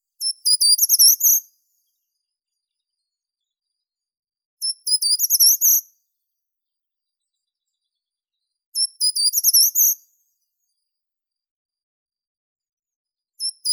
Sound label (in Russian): pishuha korotkopalaya certhia brachydactyla; zvuki prirodi